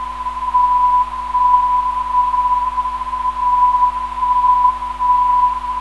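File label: 360kHz, non-directional beacon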